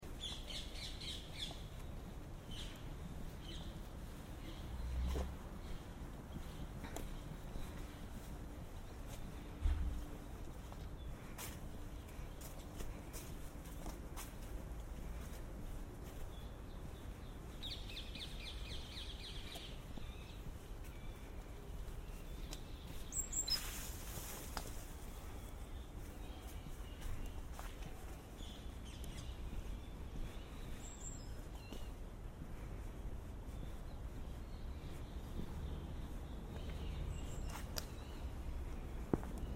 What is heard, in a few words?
London leaves and parakeets, Autumn walk